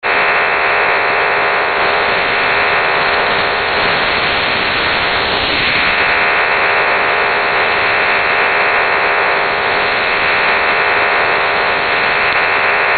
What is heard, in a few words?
DRM EASYPAL; ham